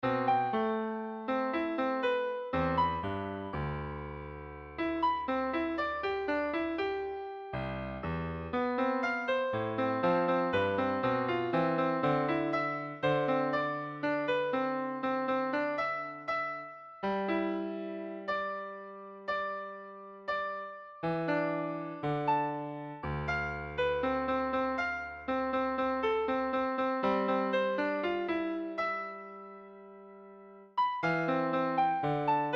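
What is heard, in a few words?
major 2 octaves; 2 octavas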